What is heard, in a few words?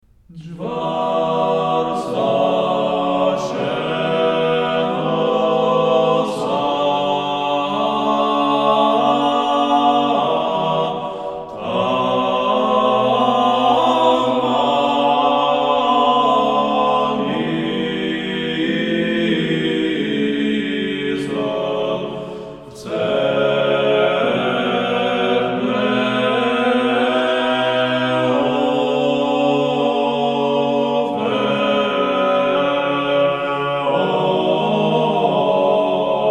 old Georgian chant; ensemble of old church music sretenye